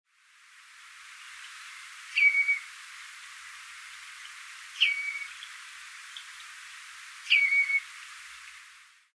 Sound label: redshank nocmig